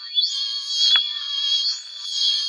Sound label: winding weird loop; Electro and Synthetic